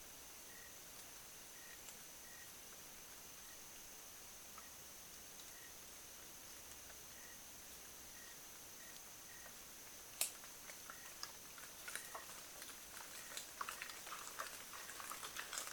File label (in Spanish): lluvia nocturna